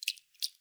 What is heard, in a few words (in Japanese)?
しぶき 中; ぴゅっ 短め